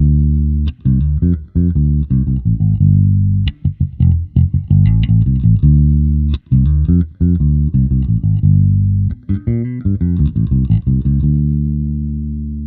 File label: Smooth FlipTop